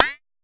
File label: Pollard Perc, Syndrum